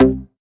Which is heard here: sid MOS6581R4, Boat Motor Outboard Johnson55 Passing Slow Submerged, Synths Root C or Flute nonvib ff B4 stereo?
Synths Root C